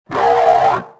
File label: gonk talk; Star Wars Jedi Knight II Jedi Outcast; XBOX